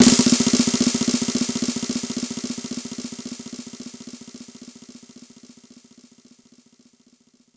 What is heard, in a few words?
Snare Roll, Korg 05R-W; Korg Snares